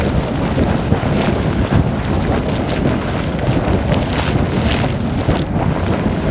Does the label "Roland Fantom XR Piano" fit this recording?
No